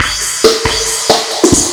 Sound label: drum loops; bb drum loops